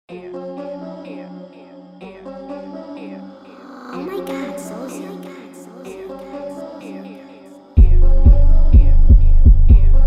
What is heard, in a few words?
instrumental